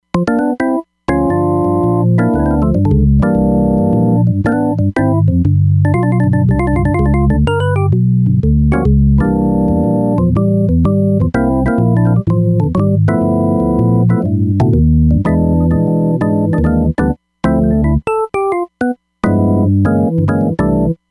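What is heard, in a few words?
LoFi Perc Organ, synth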